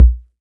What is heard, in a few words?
nice kick sample b key